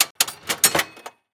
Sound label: steampunk device clicks